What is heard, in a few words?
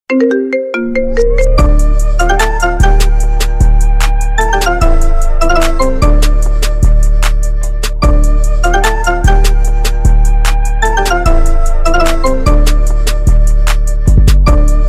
Iphone New Remix Ringtone